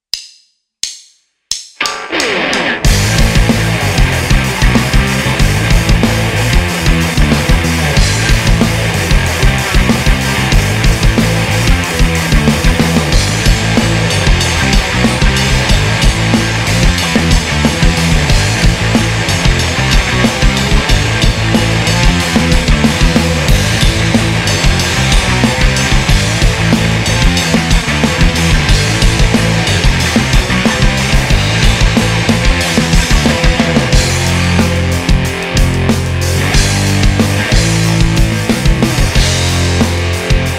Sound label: BACKING TRACK